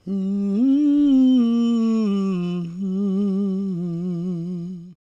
GOSP MALE, SOLO; Gospel

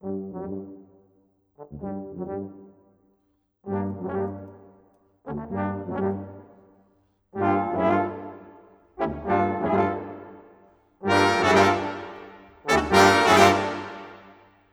Brass, Orchestral, Rock Pop

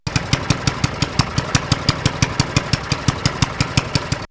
Lawn Mowner; X-Static Goldmine

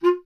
B. Clarinet

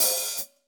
HH, Drums; Bob Clearmountain Drums I

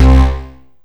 Synth Hit C; Trance; Synth Hits; Zero-G Trance Inducer; 90 s Sample CDs

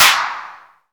CLAP, HAND CLAP

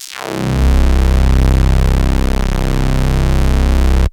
Big Synth Basses

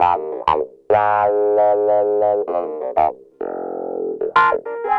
Clavinet, 70s Analog Funk; Sonic Foundry (Sony Creative Software); What it Is!

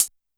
HH Cl Ana, YAMAHA RM1X

